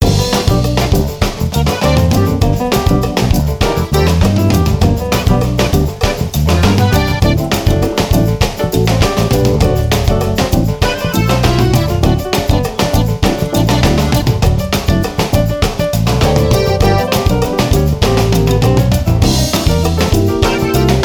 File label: Load Loop; the sims unleashed